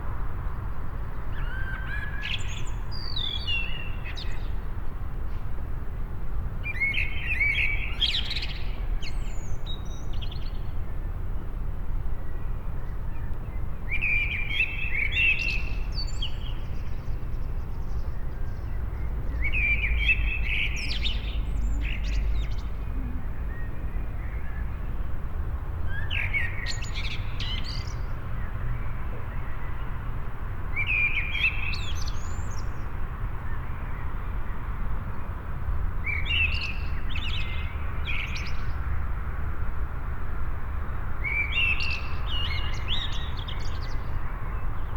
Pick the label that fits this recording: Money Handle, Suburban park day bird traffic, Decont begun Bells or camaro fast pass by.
Suburban park day bird traffic